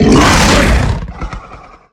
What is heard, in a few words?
Stealth Attk Grapple; Evolve; Behemoth